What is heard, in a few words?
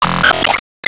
Enemy Shellwizard Active Shot; disney star smash android ios mobile gamerip 2020